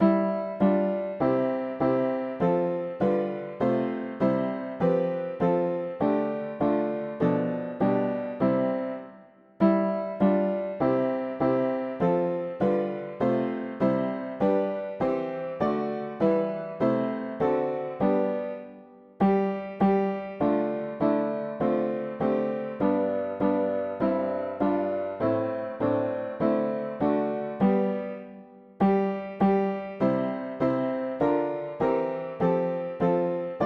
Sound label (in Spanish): mono piano; hymns; Himnario pequeno